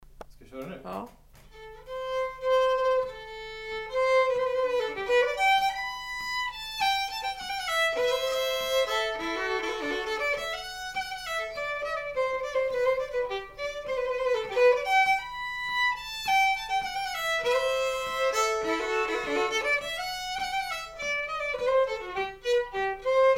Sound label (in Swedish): Snoa; Kjell-Erik Eriksson